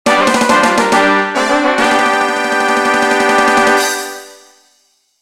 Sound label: VICTORY; Physical Contact Picture Place; 3DS